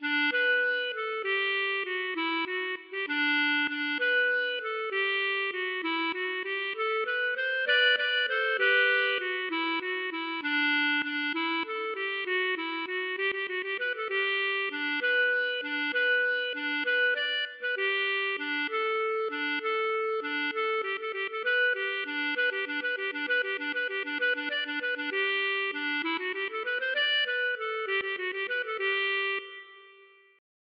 valse Ecossaise Traditionnelle

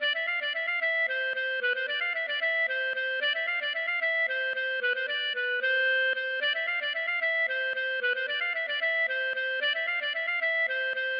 bourree

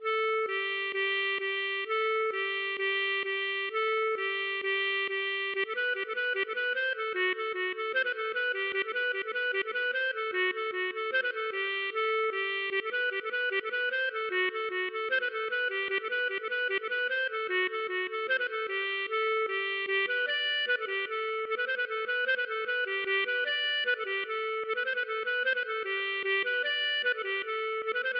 moulinet

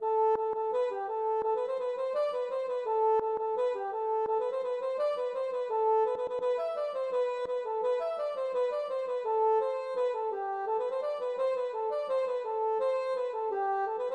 ridee

